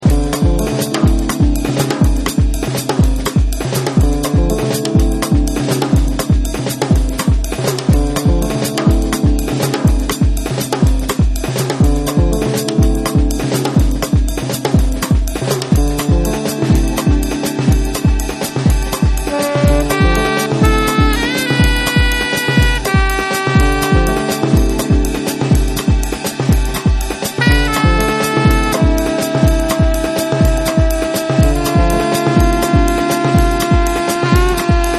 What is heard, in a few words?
electronic superjazz; sampler electronic